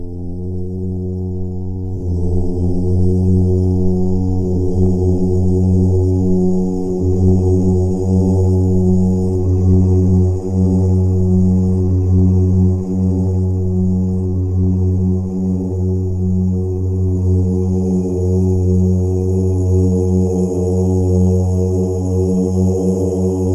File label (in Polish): mantra om